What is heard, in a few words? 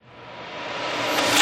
Metal Latch Snap Reverse; horror and atmosphere fx; Silent Hill Downpour